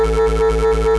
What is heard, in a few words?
Sidewinder II; A-10 Attack! DEMO